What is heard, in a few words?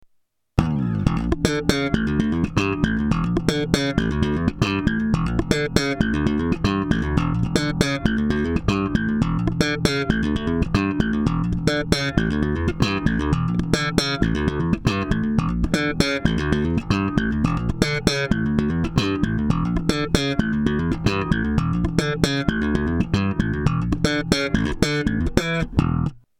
Studio Comp Bass 9:1 -18 dB GR Easy; Behr Studio Comp